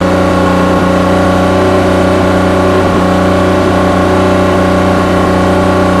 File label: LOOP, vehicles; aronwk collection; ndaudio